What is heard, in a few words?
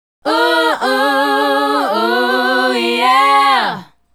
Female Group, Vocal